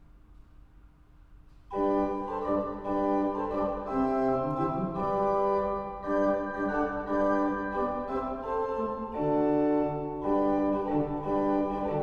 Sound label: Impro flutes